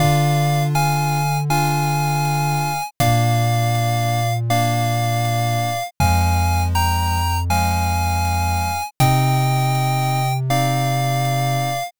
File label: bgm mellow